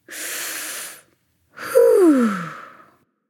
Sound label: nv breath; smite; awilix